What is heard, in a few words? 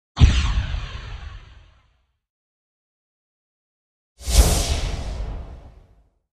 Energy shot, explosion; explosions